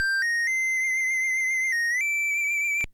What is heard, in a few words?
Protoman's Whistle; mega man the wily wars ost 1994 genesis; Mega Man 3